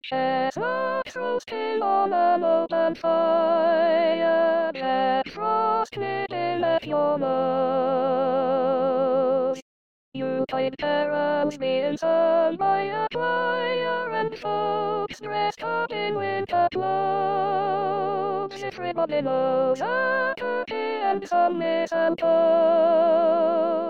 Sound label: soprano SATB